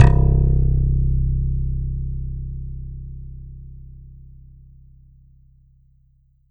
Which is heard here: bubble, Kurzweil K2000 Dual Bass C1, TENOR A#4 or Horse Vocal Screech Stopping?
Kurzweil K2000 Dual Bass C1